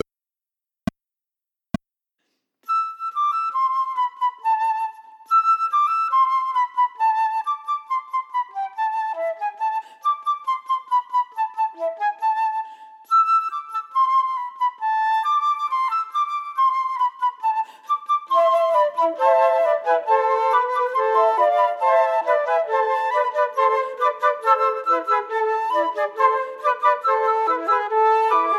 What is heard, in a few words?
Quartet minus 2nd part; Rimsky Korsakoff